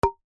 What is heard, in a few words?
impacts wood thin small panel knock hit lite